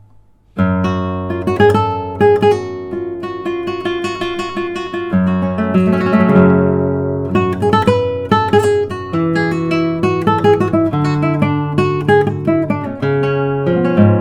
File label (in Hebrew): פתיח לרומבה